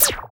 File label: FX EFF, ANALOG; Sound & Vision - Gigapack II